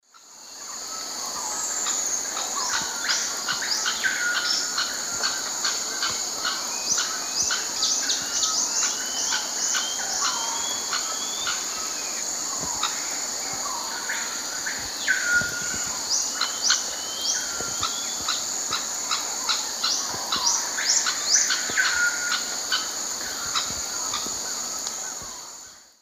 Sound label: Habia rubica, call; S. America; Eastern Lowlands